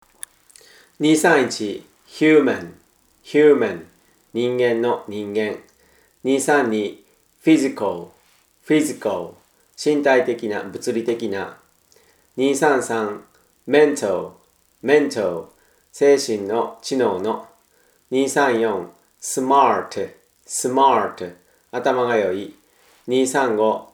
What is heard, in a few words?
tango